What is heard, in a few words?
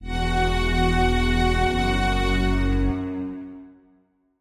Strings